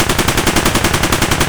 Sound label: ak105 distant loop; Escape from Tarkov